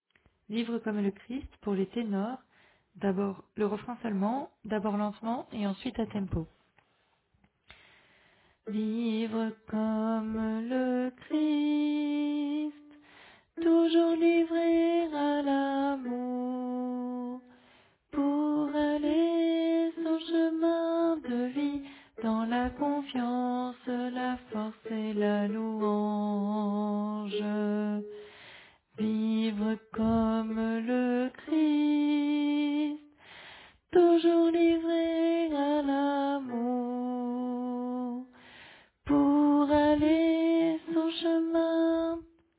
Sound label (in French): Tenor